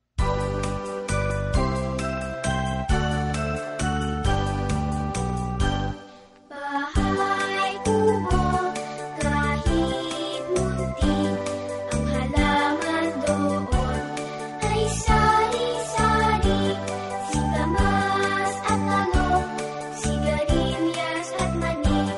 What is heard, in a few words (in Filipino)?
tagalog song; bahay kubo